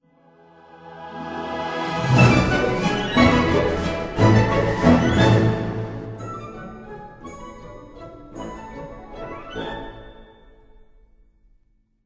Nokiorchestra, Nokia Tones; Windows Phone apps

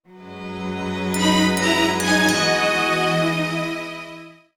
Classical, ringtones; Google; NewWave Labs

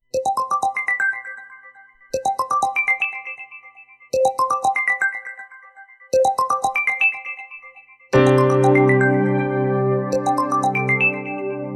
ringtones; Meizu; MX2; Flyme Tune Dream

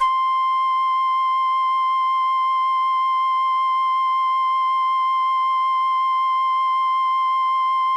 flute seq; miniBAE source code audio

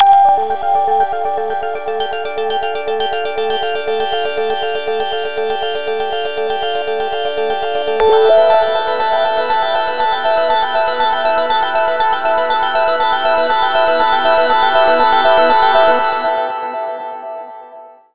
Ambient; 9210 CD

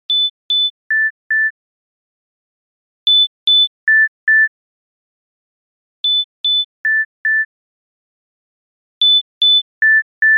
Step Beep; LG; C440

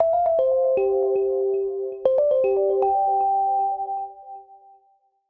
ringer thoughtful, ringtones; Mozilla Boot2Gecko

